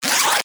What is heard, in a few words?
zipper